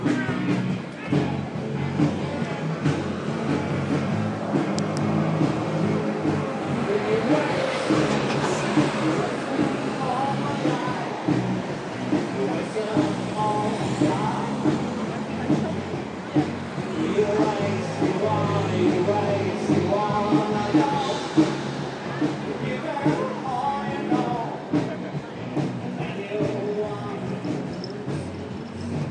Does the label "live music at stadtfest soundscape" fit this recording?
Yes